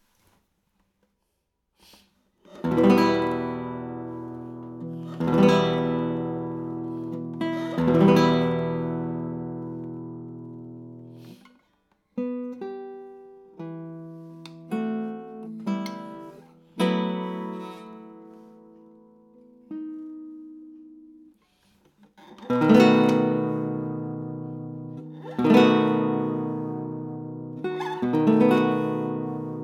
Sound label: Tornavoz, classical guitar